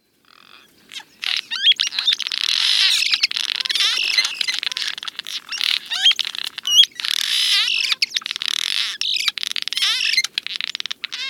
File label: Cliff Swallow; winnipeg